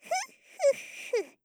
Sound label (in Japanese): ふっふっふっ, 笑い声